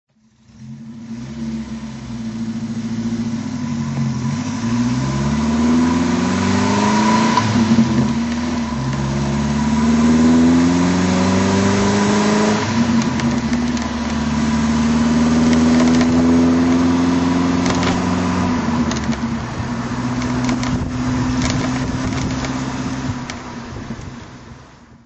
morgan4 4 drive

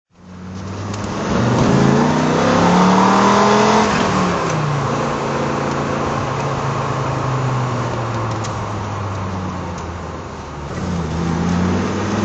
bristol fighter drive

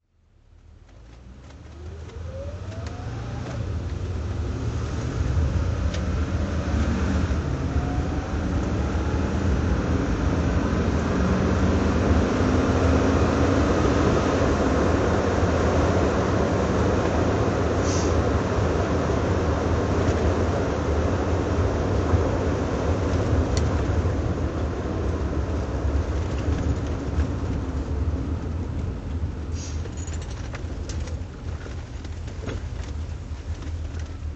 mercedes 280se V8 drive

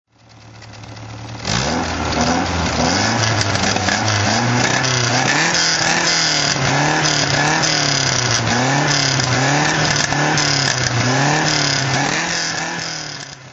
lotus elan sprint throt

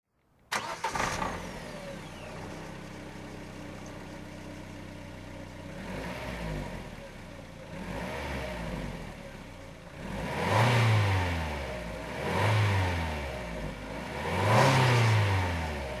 morgan 44 zetec throttle

